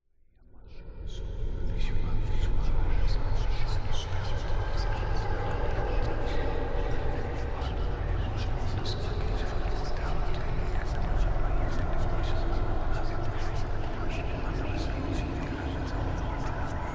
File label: horror drone ambience dark ghostly whispers; zapsplat